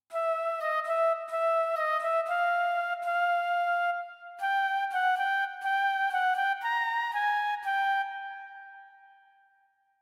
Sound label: Flute, XV-88